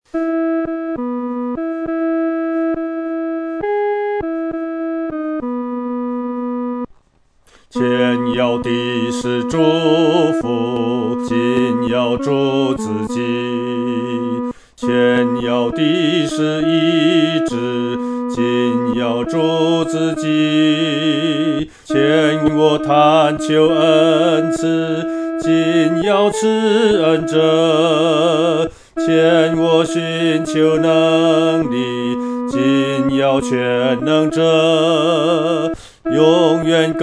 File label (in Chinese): 独唱 第二声